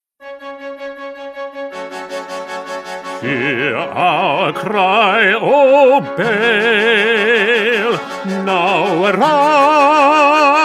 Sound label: Bass; Mendelssohn; Elijah